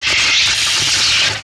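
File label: Laser Fire Interior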